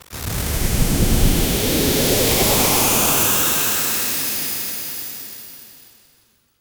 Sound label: general magic spell, burst